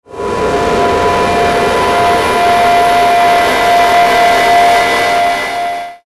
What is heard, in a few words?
Eerie Bright Fuzz Swell Feedback; Warped; Blastwave